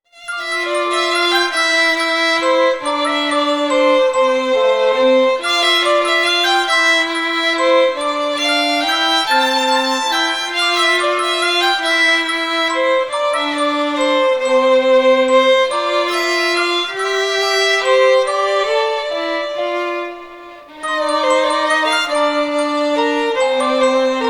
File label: Music Box Violin Cover; Pony Music Archive